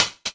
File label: Hihat